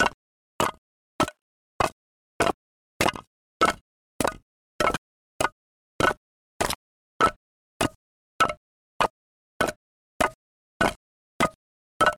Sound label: walk geta